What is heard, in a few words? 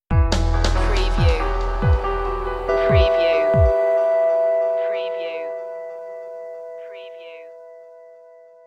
ethereal circuits stinger